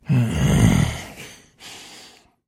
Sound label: trader tongue smacking; 7D2D; trader hugh